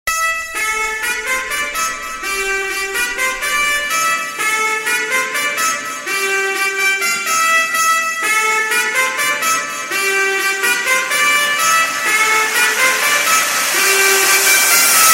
Trumpet; SafeSound

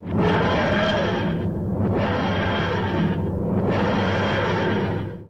TARDIS

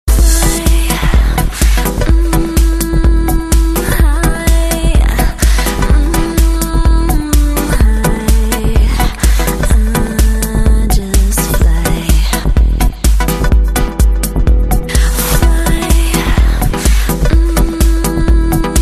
Fly High Remix

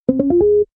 Very Short Beep

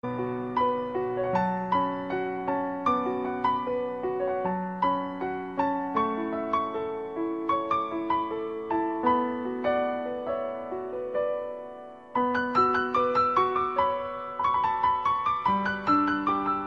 slow Instru

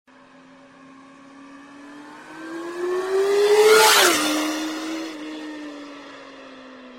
Bike

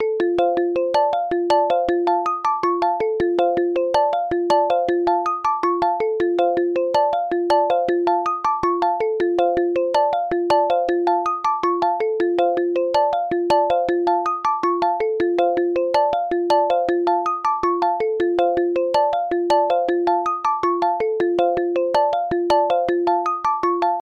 Phone Ringtone

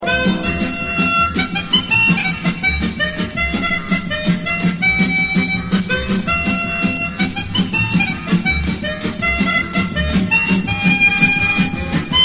Sweet Melody

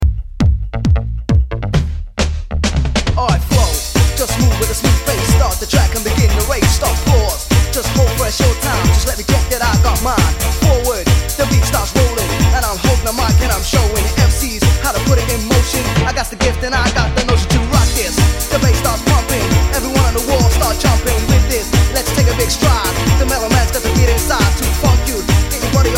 Big Bass

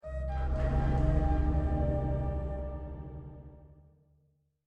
Sweet Sms

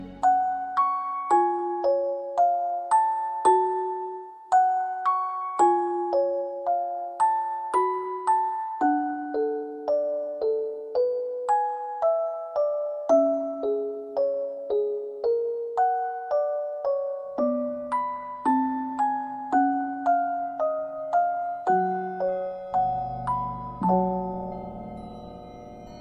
Music Box

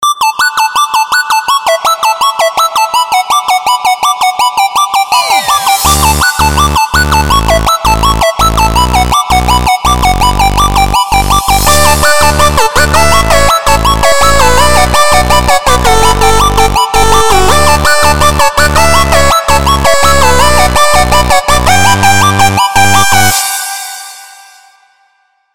Breeze

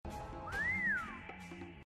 Whistles; Alucard

